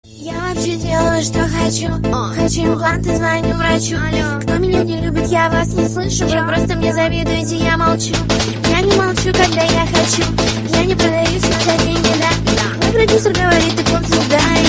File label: lezginka remix